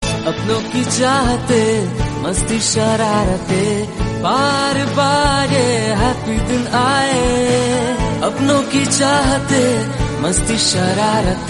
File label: Birthday Song